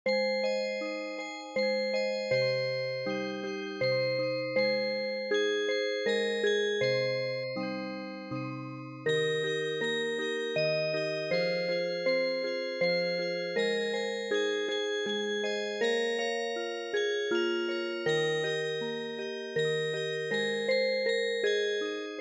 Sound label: Music Box; gim gye hwa